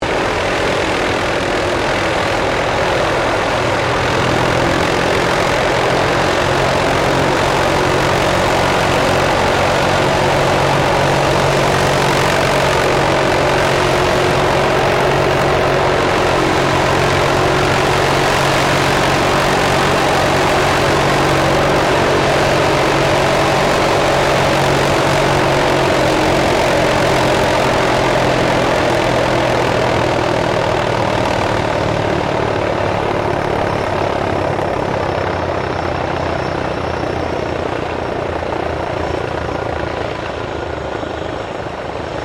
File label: AIRCRAFT LOCKHEED L-1049 SUPER CONSELLATION PREFLIGHT; Connie, Super Constellation; 1955; Airplanes